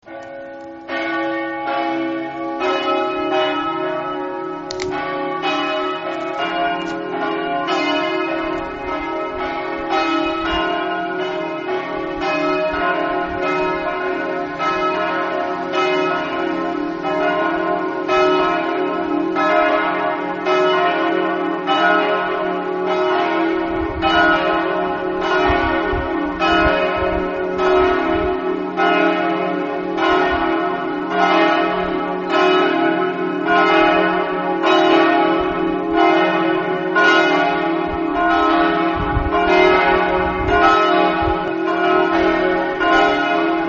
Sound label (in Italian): monfalcone, campane